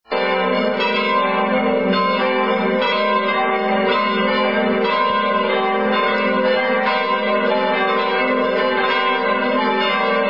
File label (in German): Glocken